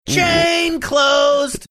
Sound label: singing chain closed